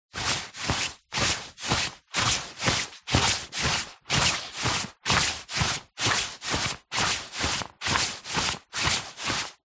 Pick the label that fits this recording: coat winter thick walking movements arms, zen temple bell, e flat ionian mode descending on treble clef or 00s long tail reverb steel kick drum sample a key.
coat winter thick walking movements arms